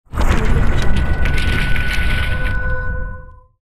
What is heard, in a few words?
impact rumble